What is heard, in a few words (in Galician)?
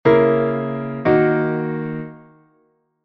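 semicadencia sol sostido menor; armadura 5 sostidos, dous acordes: do-la-mi-la; re-la-re-fa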